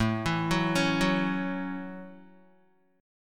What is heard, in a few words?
A 6th A Guitar, chord; arpeggiated; JGuitar; 4,7,6,6,6,x